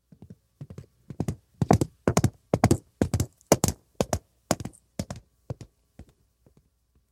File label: horse galloping; wilder westen; dragon studio